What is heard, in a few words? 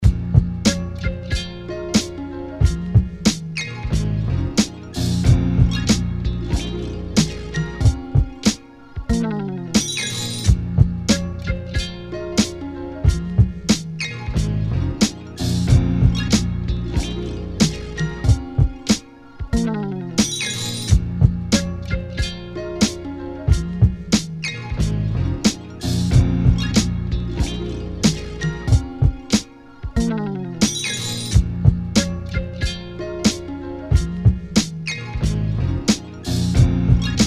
Beat